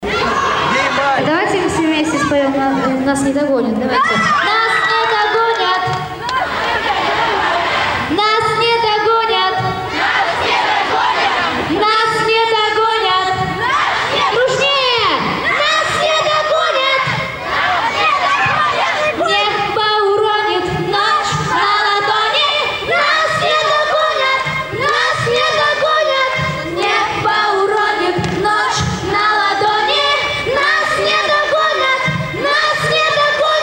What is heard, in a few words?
Singing Acapella Live